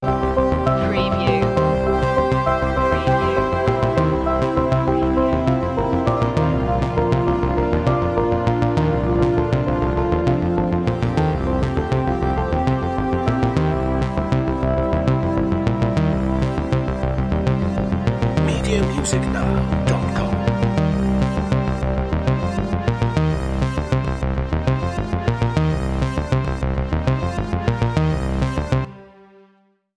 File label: transition